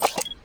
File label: open binoculars